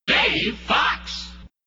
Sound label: jingles shout; positron